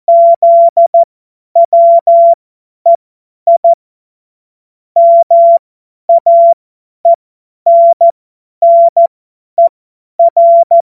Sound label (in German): Witz, Morsekurs, 25 F70; Witze